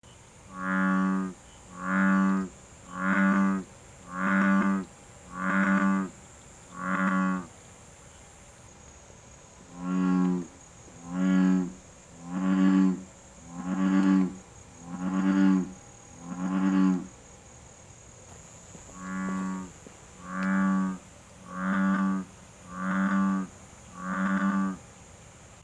frog, soundscape

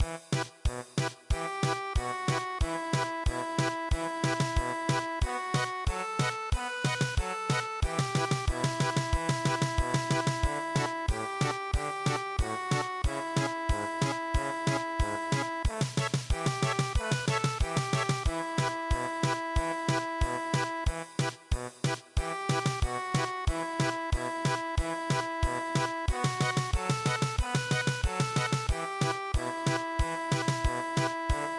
German Polka, traditional; East Virginia

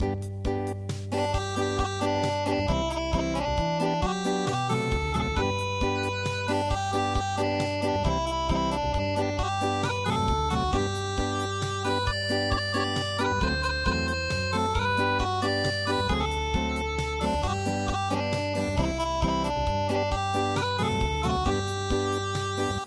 Unplugged

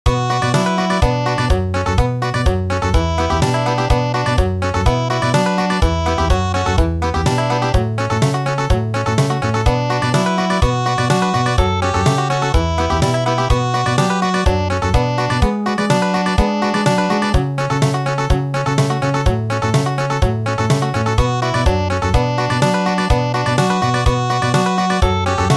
Country

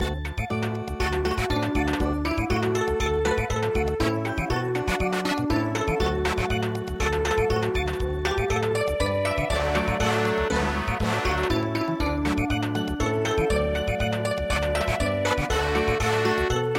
hazy moon Samba

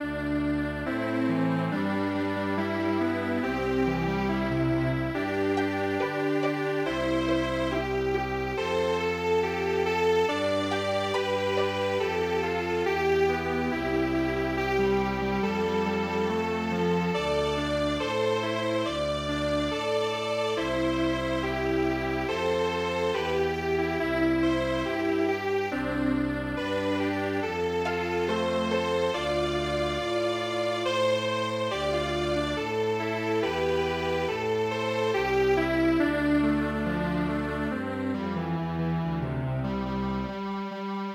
strings; kimigayo

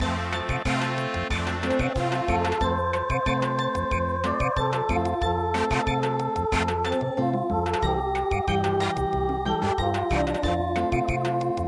Samba, traditional

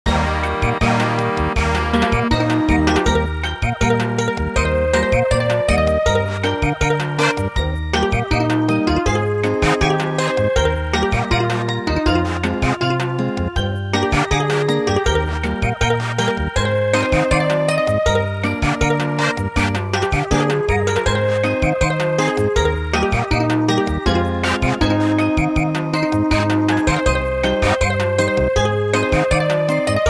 Samba; WAKAYAMA KENMINKA

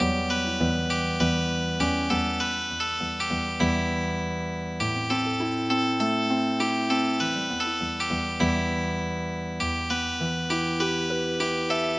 Healing Harp, midi

